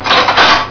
RATSCH

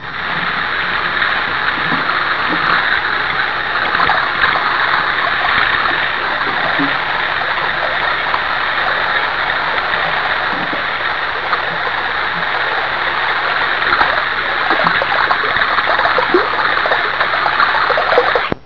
DUSCHE

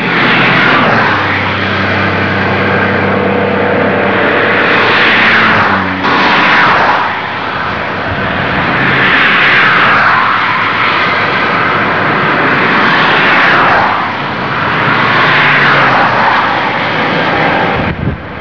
autobahn

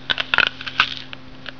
Biss

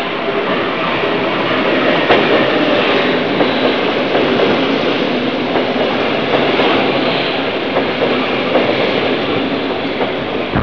UBAHN

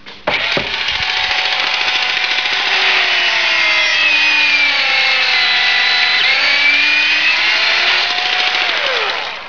Bohr

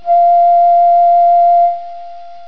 PFEIFF

